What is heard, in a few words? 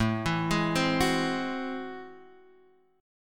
A Minor Major 13th, down, Guitar, Open G